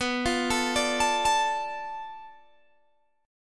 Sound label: B Minor 7th Flat 5th B, Guitar Open G